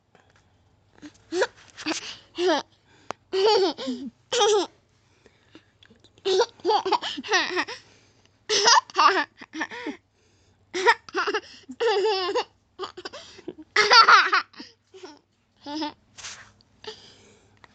Baby Giggle

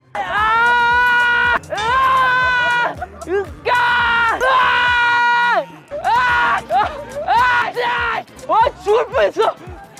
yelling

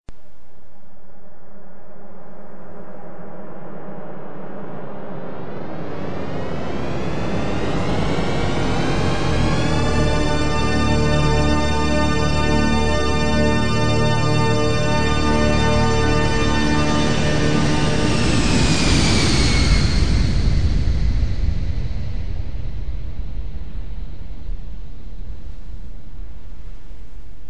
Jet

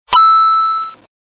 Coin; Mario

